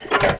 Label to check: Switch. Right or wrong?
Right